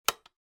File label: switch